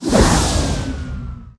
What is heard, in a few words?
bike turn